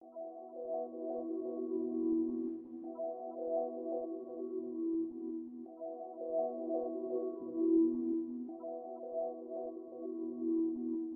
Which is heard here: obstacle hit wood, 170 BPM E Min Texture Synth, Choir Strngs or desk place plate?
170 BPM E Min Texture Synth